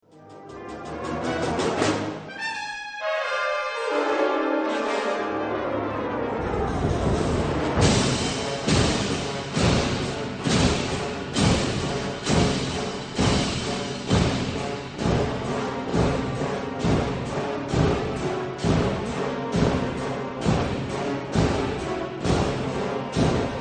brass

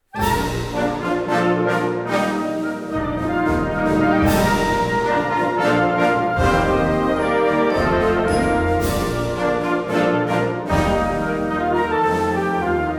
seven short fanfares

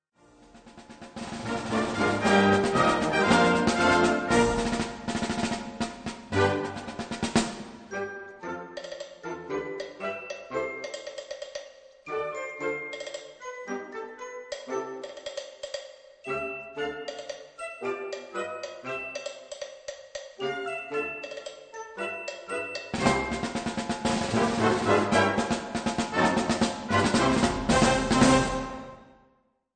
three little pieces for percussion

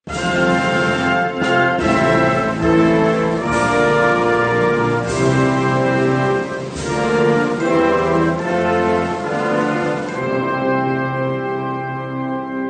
Galatea waltz

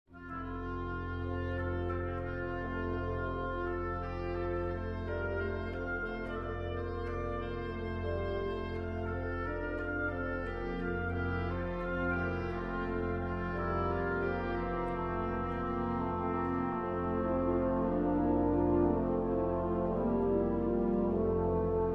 pastorale for organ

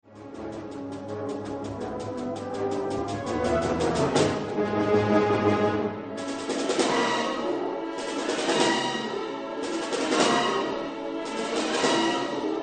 brass

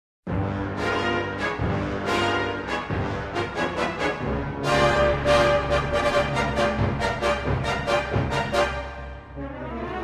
a fanfare

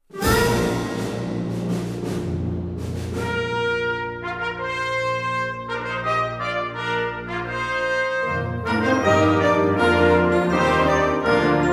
seven short fanfares

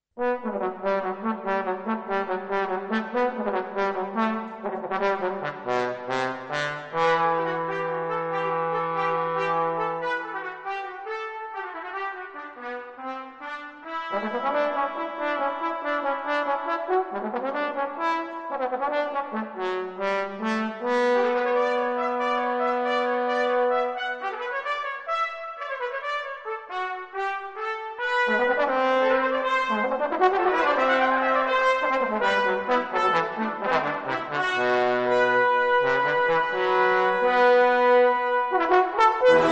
Sketches for trombones and band

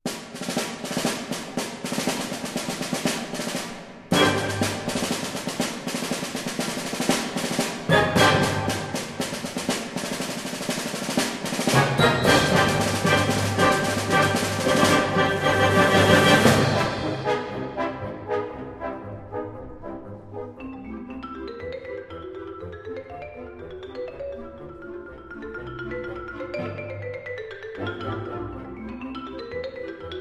concerto for percussion

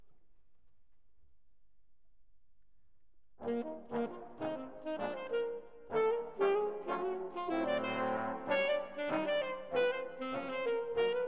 concerto for alto saxophone and band

